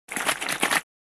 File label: Shaking liquid inside of a plastic bottle